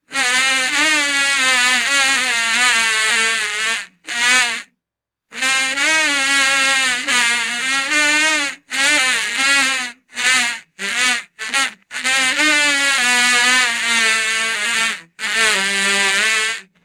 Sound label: erratic cartoon fly buzzing